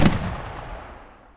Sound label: 50cal ringout; WAR